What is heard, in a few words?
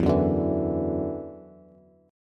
E 7th Suspended 2nd, down, Bass; E7sus2; strummed